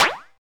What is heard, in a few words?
Bounce Up; FREE SFX PACK VOL 1